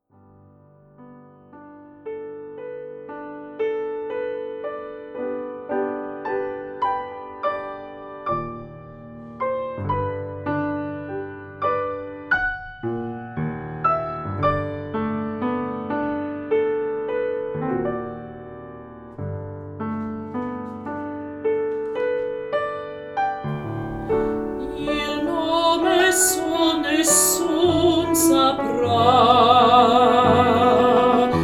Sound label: Alto Line, Nessun Dorma; OPA Fall 2018